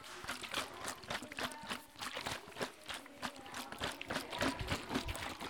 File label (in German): Flasche schütteln